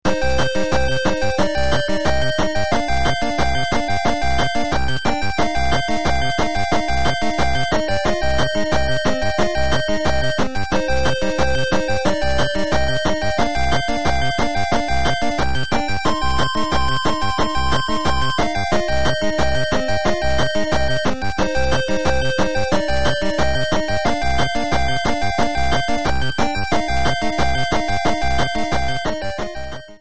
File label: sea stage critical